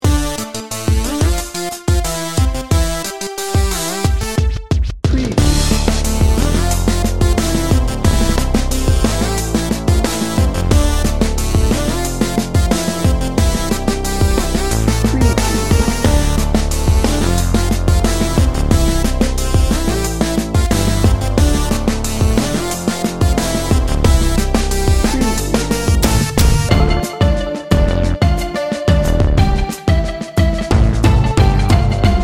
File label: urban adventure 60 second